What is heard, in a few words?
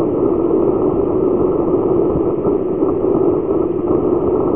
raw noise